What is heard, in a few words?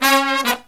108 SOULS, Killer Brass Riffs; USB Soundscan vol.29; AKAI